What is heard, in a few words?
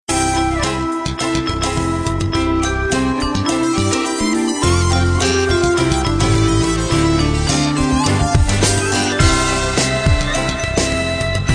jingle bell